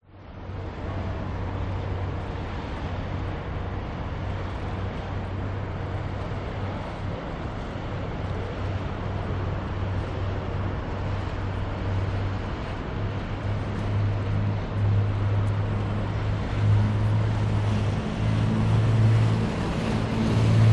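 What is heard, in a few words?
public places city quay motor boat passes distant voices water lap sydney harbour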